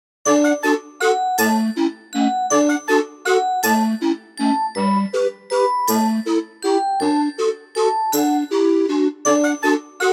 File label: ting ting ting